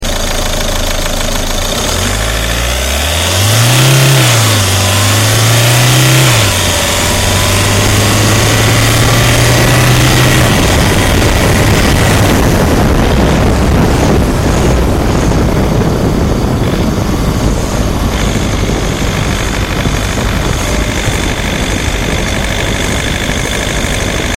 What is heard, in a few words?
Ford Focus Ghia TDCi Acceleration Outside